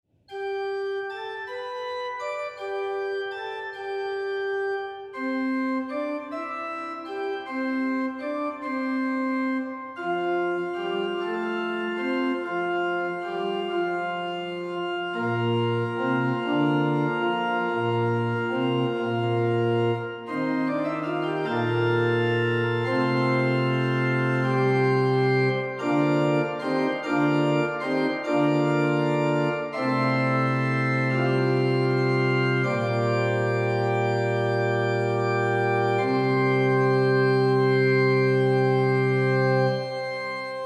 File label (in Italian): innario organo, organist; Carlotta Ferrari